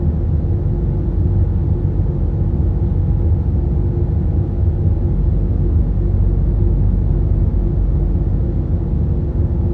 DRONE Server Room Loop; SmartSoundFX; Krypton

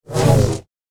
Double Bladed Saber Sound; FXHome

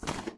cloth; Kenney's Sound Pack; RPG Sounds

